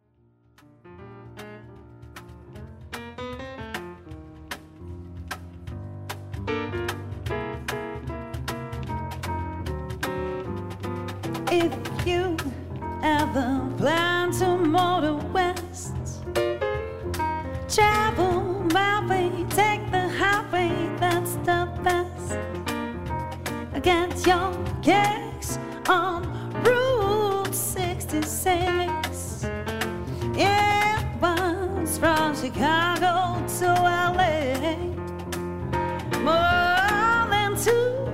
lounge trio voc p perc

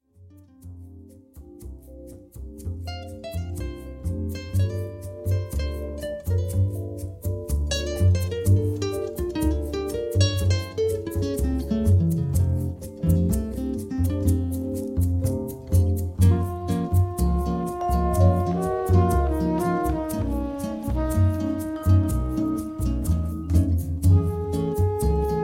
jazz

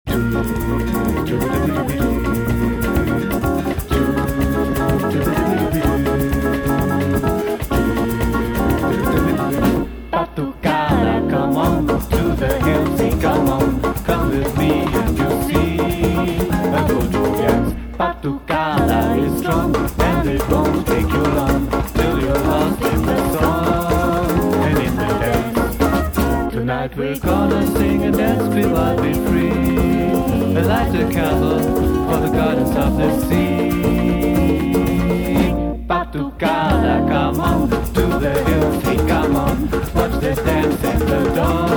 Batucada

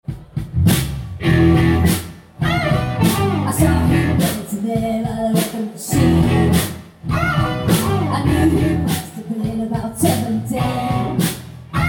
reduzierte publikumsgeraeusche

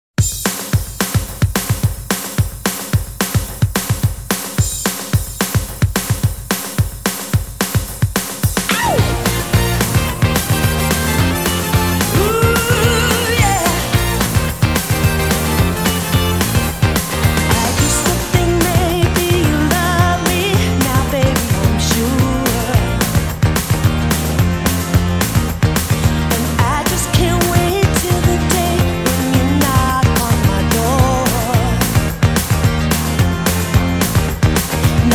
105 bpm

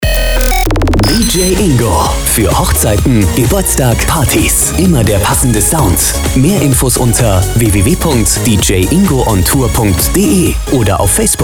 jingle

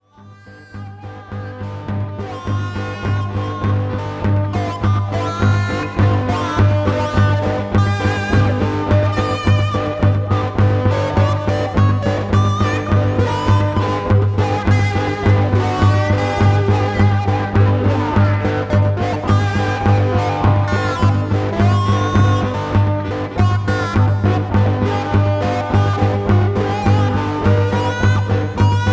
shuffle

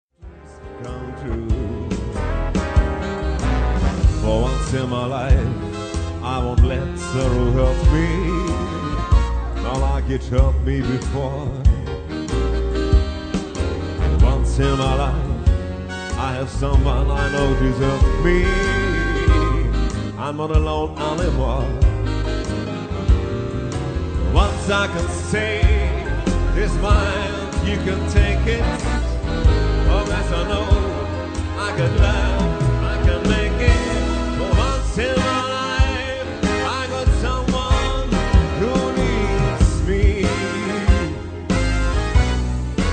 bigband swing

